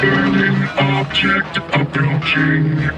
burning

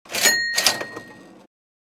kaching

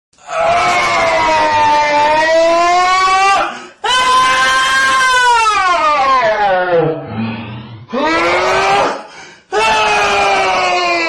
scream

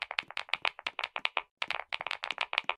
duolingo bing

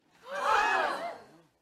crowd med shock

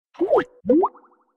camera opened; skype for linux

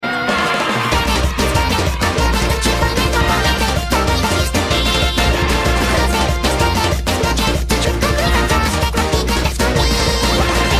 static

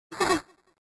laughs

